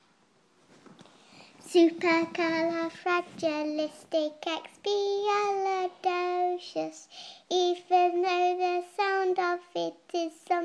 small girl sings

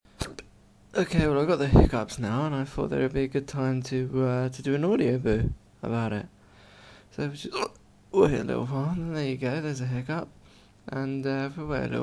hiccups

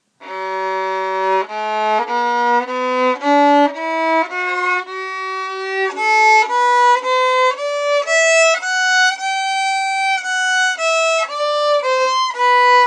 scales on violin

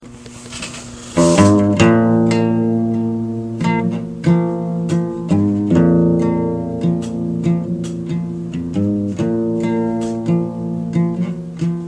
strumming

